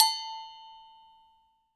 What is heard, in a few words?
LOW AGOGO F, PERCUSSION; Sonic Reality; Interactive Drum Kits